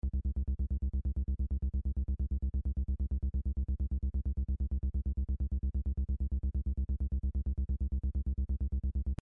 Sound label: Roland TB 303 Filter Test